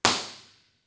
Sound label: Small Room ImpResp